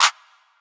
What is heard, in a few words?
verby clap one shot D key; single hits one shots